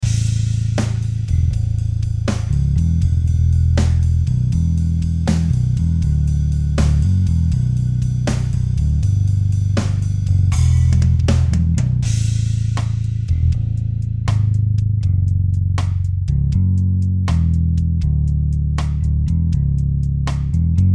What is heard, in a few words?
Rick n bass; MODO BASS; IK Multimedia